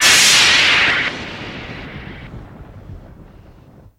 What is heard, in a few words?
Underwater Laser Fx